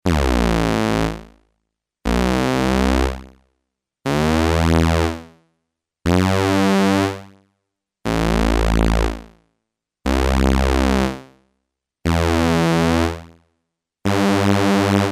Cybersound T1 phasenverschiebung

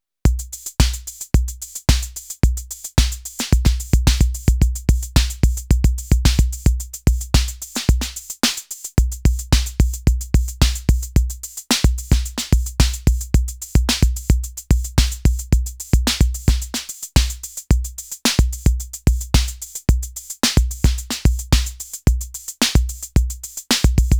elektron analog rytm euklidischer sequenzer

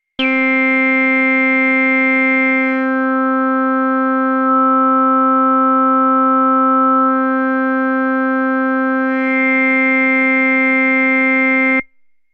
Genus Zwischenpole; Rossum Electro Music Evolution

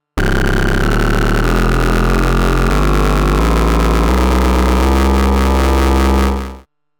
Waverazor Dual Oscillator Slice verschiedene Key Track Werte; MOK

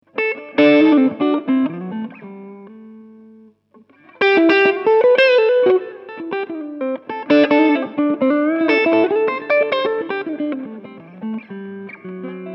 hals clean

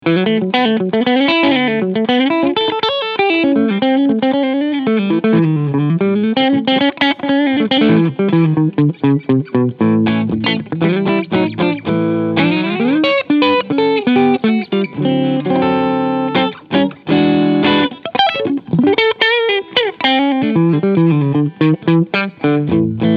Framus Diablo II Supreme beide PUs Humbucker Modus

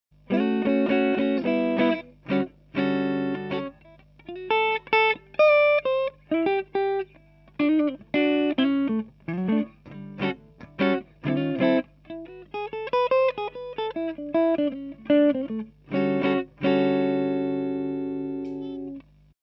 HB Hals clean

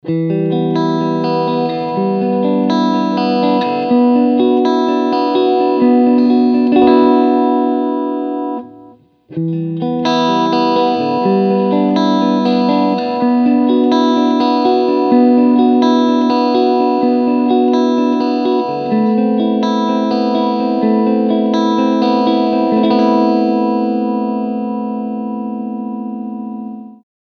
SC Mode Steg HB

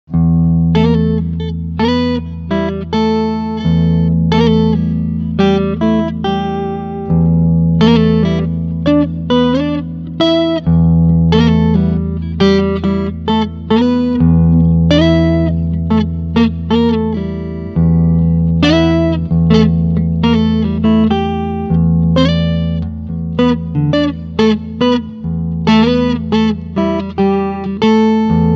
Doubling beide Humbucker

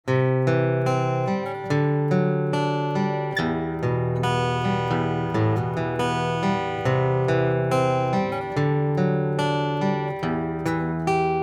Picking Mikro und Pick Up

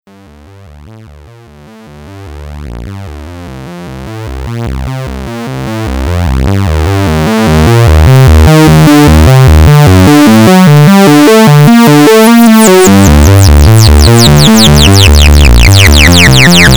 iSEM Simple Filter Resonanz Lautstärke Verzerrung

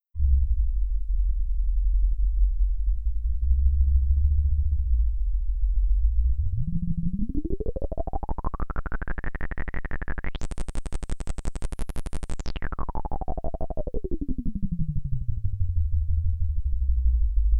Pulse 2 Preset laut und tief

